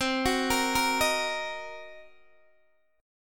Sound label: C Minor 7th Flat 5th C down Guitar DADGAD